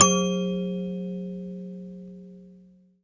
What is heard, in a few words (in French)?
cloche bizarre